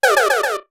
Fireball; LTTP; Agahnim shooting a fireball

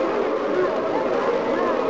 Wizrobe Split; Wizrobe splitting into multiple ghosts

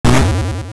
Boulder; A boulder falling down Mt. Crenel (also used when Link dashes into a wall)

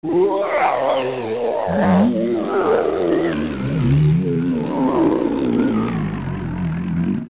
Groan; Phantom Ganon; zelda; OOT

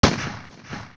Gunshot; zelda; OOT

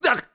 Roll Clash; Link rolling into a wall; Skyward Sword; zelda

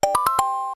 Objective Close; Open and close the objective screen

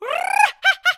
Brrrrrah Ha Ha; Bomb-Shop Man: "Brrrrrah-ha-ha!"

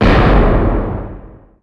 Cannonball Fire; Pirate Ship; Zelda

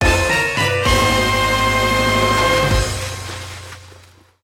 Get Item

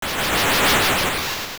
Combine; Twinrova combining (immediately followed by the Veran Shapeshifting sound)

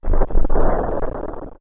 Thunder; Thunder during nighttime

